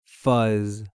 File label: Fuzz